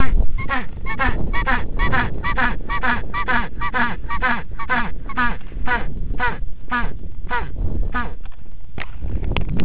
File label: penguin; chile bolivia argentina 2005